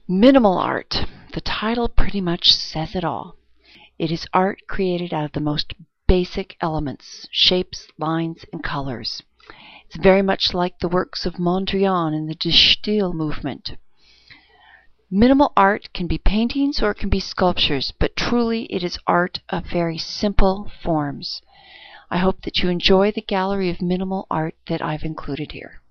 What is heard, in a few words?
minimal